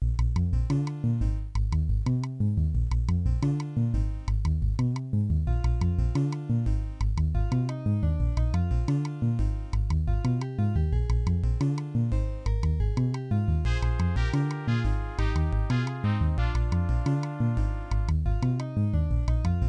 level1 prometheus aria16; Phrometheus Aria16 (external player); soundcard test; Hocus Pocus

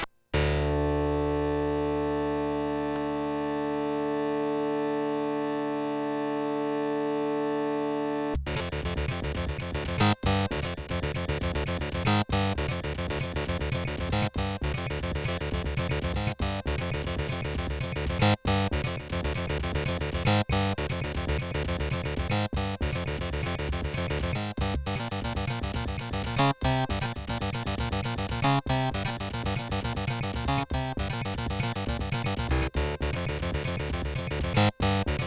nvidia nv1 opl; Nvidia NV1 (OPL2 mode); soundcard test; Doom

